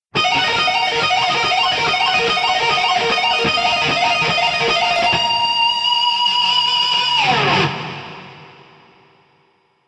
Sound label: lick; brutal legend